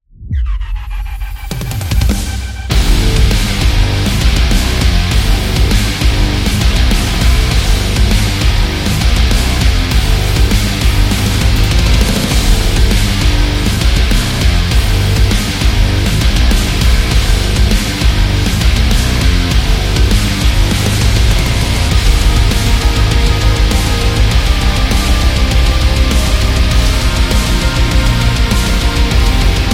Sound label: Modern Metal